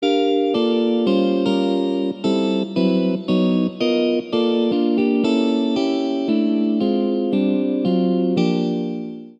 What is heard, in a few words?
chord